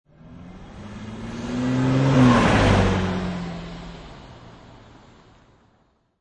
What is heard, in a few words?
Mustang 68 Fastback Drive By; Cars; Lynne Music